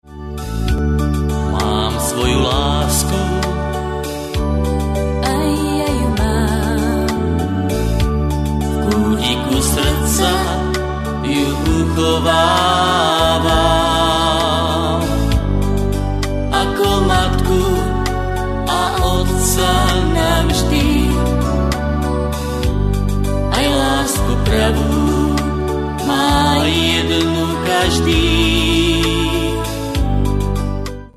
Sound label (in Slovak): akord